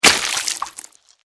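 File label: foley splash blood medium; imp audio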